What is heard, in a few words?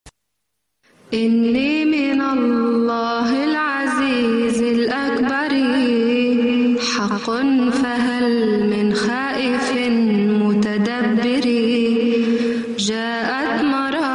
arabic qaseeda